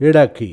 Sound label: Yidaki